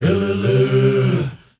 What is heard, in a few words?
MALE HUM; Spectrasonics; Heart of Africa